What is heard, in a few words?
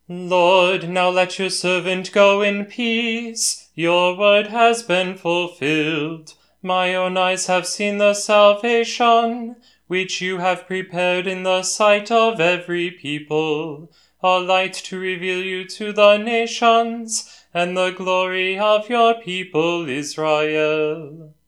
gospel canticle